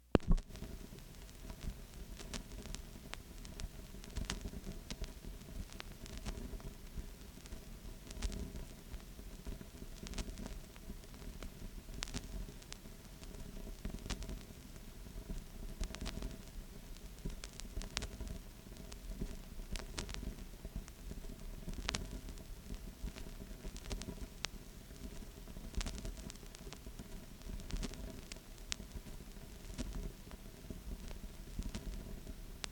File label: Vinyl Crackle 33rpm